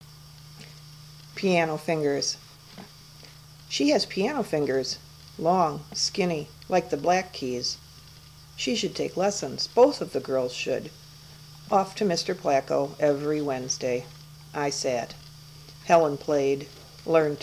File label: Piano Fingers